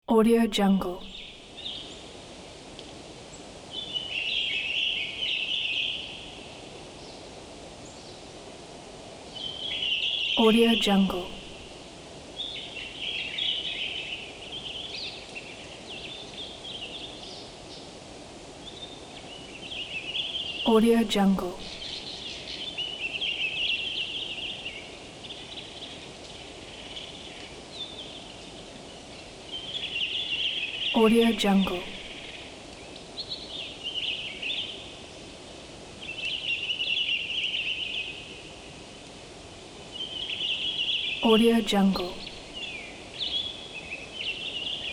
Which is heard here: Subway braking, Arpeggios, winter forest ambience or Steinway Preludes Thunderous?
winter forest ambience